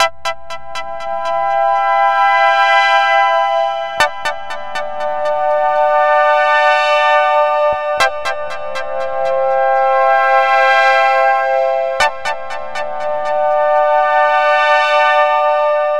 Stabs Swell Pulses E D C D, Cinematic Trailer Loops